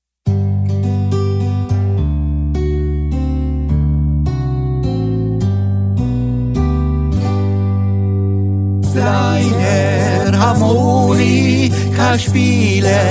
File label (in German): Harmonikaspieler; Kleiner Harmonikaspieler